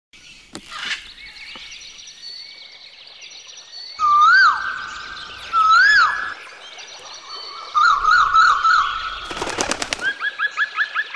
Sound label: Birds and Nature Music